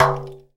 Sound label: AX MIX PC; AKAI S6000 CD-ROM - Volume 1; DRUM RYTHM